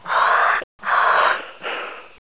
xenome tank breathing

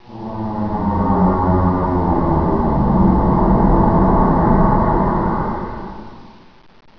groan

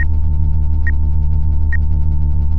comp idle

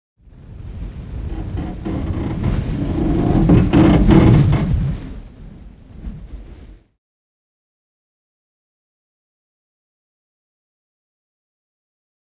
wind metal gust; polarcomplex

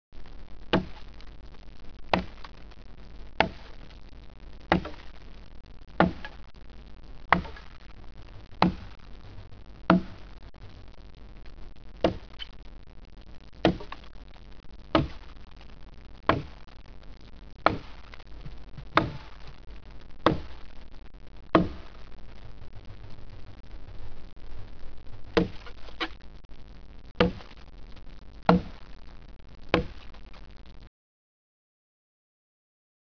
tree fall

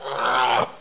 monkey

